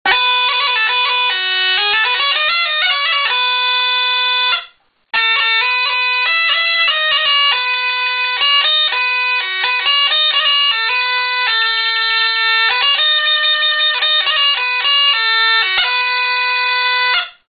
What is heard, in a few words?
gajdica, bagpipes